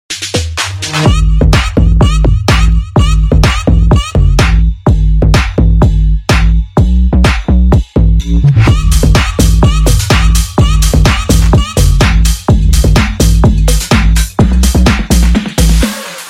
iPhone SE Ringtone

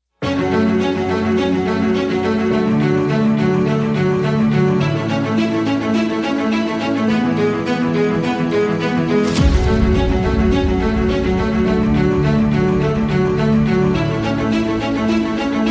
epic inspiration ringtone

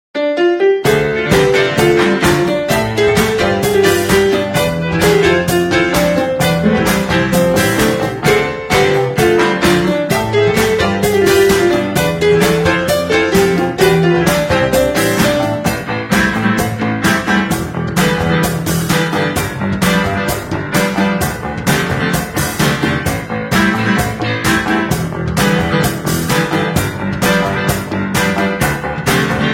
piano version

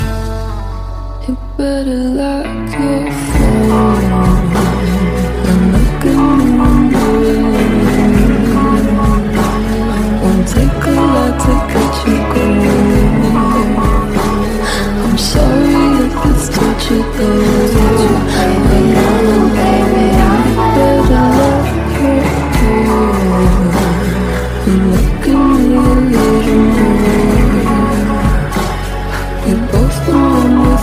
bossa nova x west coast ringtone

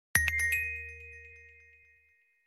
iPhone WhatsApp Notifications Tone

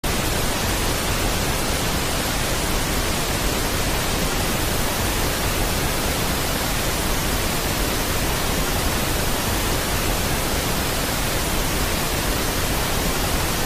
Balanced Noise

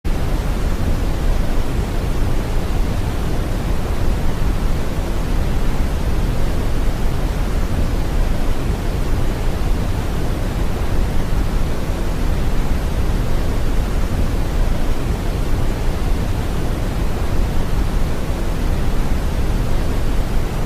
Dark Noise

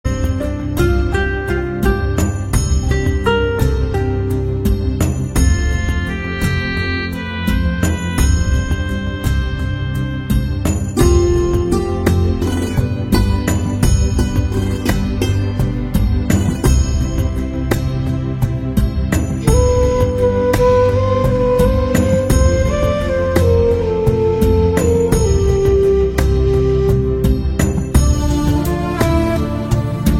Vande Mataram instrumental Ringtone

